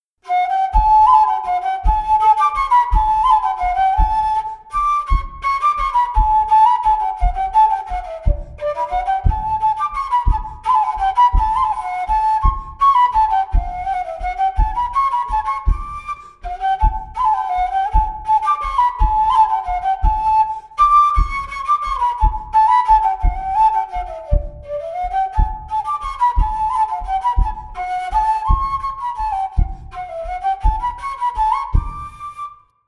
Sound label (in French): Valse